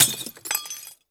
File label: mlay break, weapons